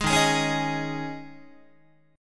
Eb 7th Suspended 4th Eb, Guitar

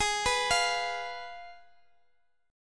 G sharp Minor 6th, Guitar Drop D; x,x,x,13,12,13

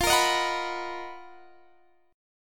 B Major 7th F, chord; strummed

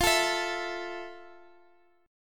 F Major 7th Guitar Drop D, chord; down; chord shape

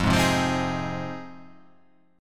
F Minor 7th F

